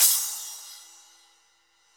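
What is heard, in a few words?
Cymbal, Roland; Club-50 - Foundations Roland; Naturals A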